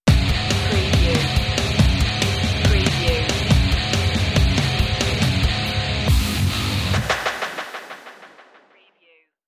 dub metal stinger

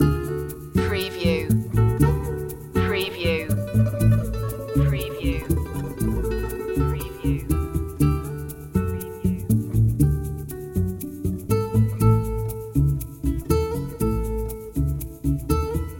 spain guitars loop

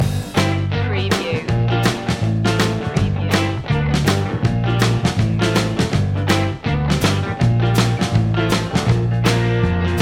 vintage blues loop

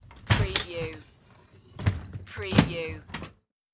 Stretcher Load Into; Sound Effects Bible

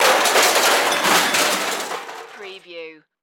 Crash Metal Debris

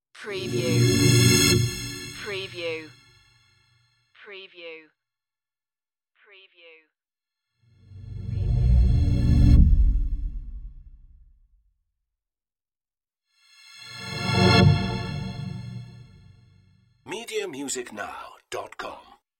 shiny magic swishes

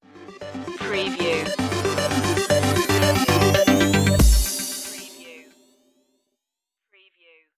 electro circuit stinger

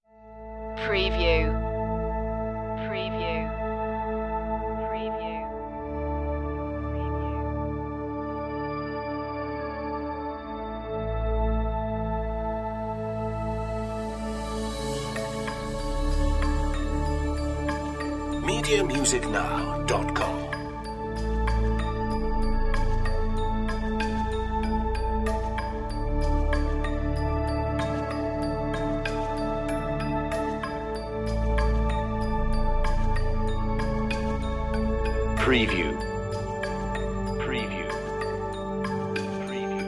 african sunrise

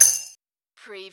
Button Sound - Metal Nut Drop

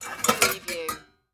Pots Movement Remove

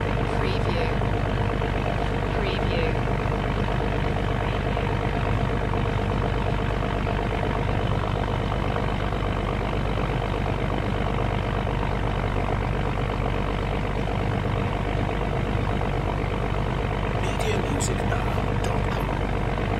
ferry engine running

